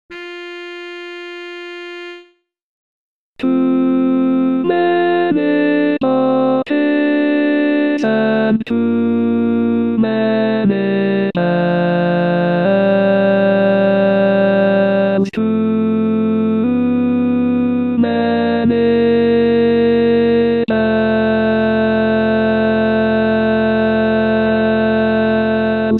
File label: Lead, barbershop